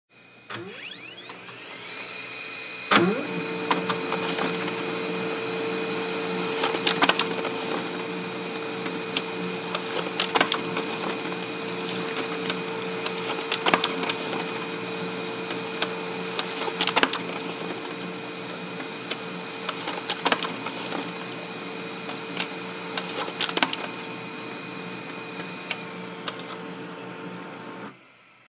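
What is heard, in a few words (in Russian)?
печать принтера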